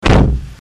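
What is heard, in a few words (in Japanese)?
kuruma, car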